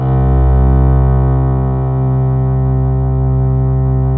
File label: CELLOS C2-L, CELLOS